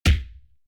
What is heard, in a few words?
enemy punch